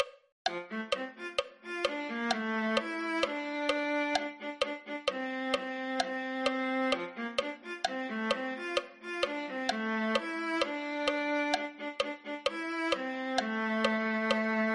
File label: viola